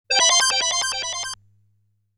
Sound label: 1Up